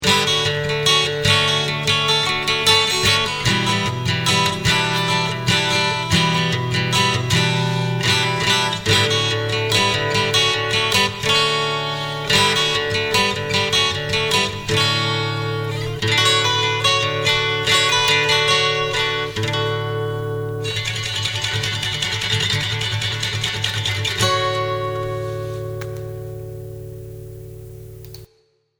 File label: guitar